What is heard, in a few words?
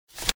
Unlock Puzzle; Samsung Galaxy Tab 2 7.0